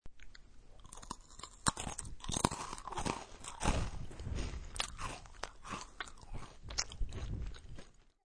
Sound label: Crunching and munching a cracker with slight lip smacking.; Chewing a Cracker; Eating (Sound Effects:Human)